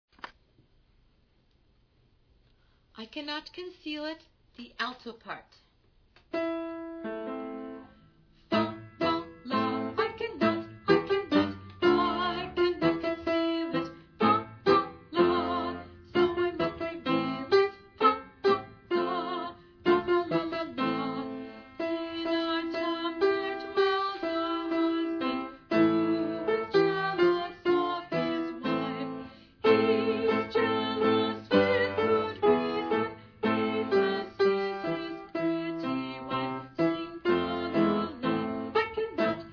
ALTO

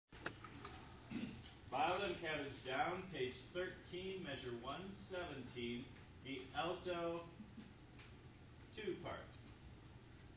ALTO 2

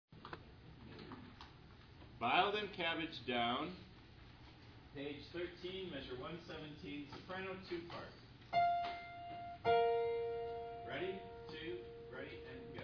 SOPRANO 1

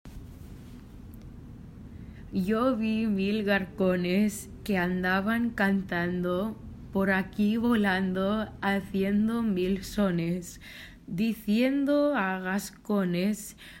RIU RIU CHIU SOLO Verse

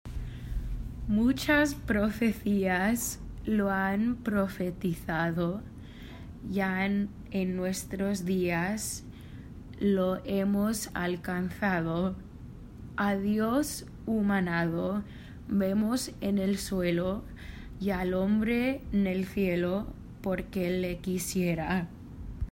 RIU RIU CHIU SOLO Verse

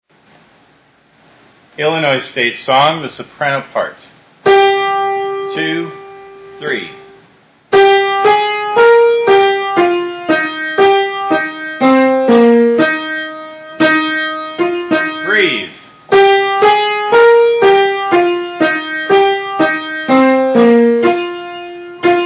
SOPRANO

